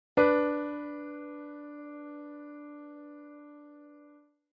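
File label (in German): Intervalle große Sexte D; Unreine Verminderte Uebermaessige Intervalle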